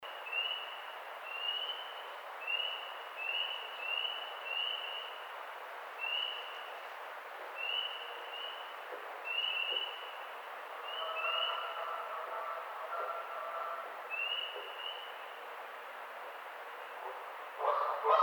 Canada Goose

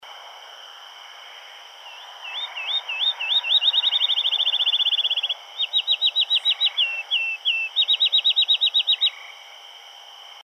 Field Sparrow

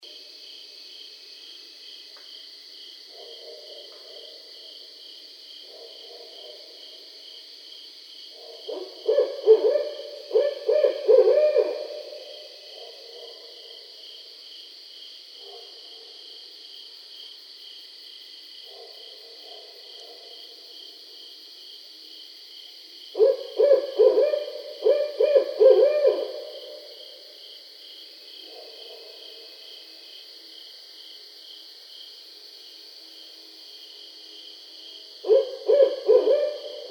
Barred Owl

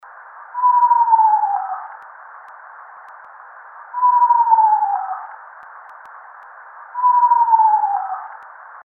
Eastern Screech Owl

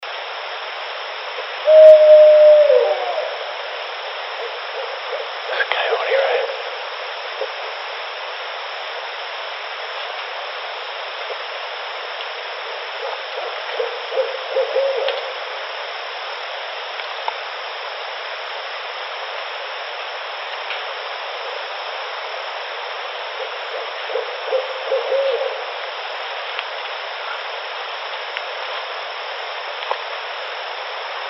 Branches Breaking; bigfoot

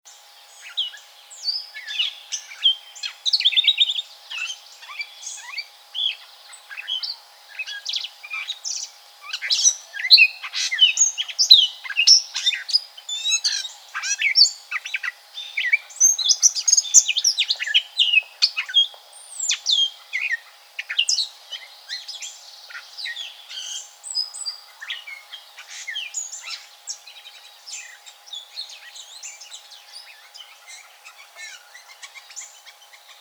Brown Thrasher

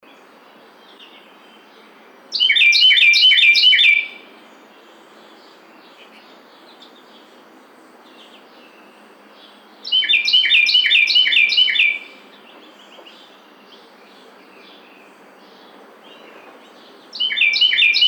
Carolina Wren

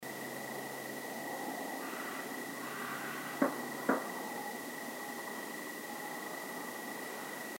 Wood Knocks

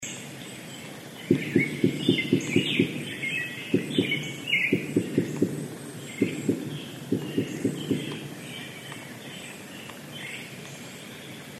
Wood Knocks; bigfoot

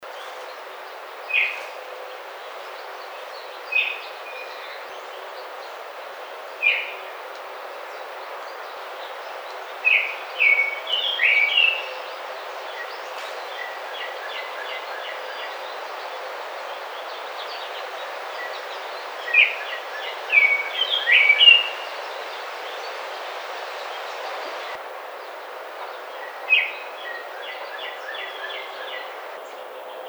Baltimore Oriole

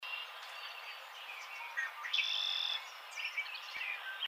Mourning Dove, birds

